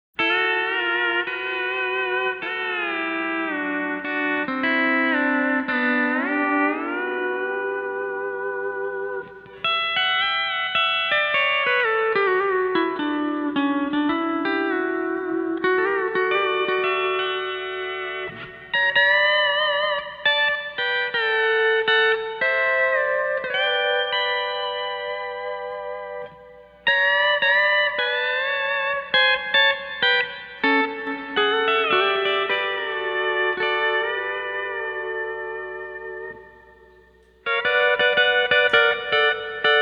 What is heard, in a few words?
All Steel, Walker Stereo Steel